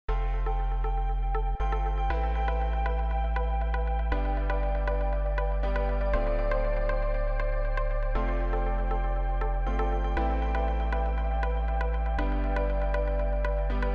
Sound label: Electro Pop Instrumental